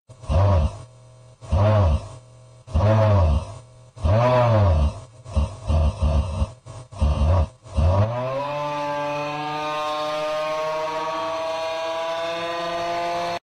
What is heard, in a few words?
augh auugghh aaauuuggghhh